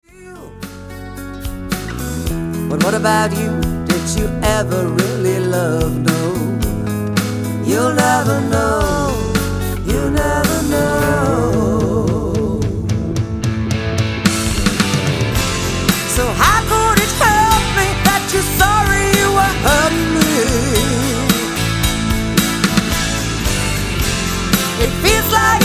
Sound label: pop-rock, ballade